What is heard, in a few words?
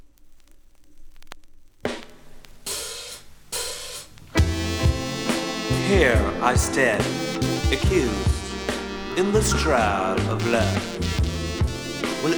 Jamaica Sweet Soul Fuzz; CRYSTAL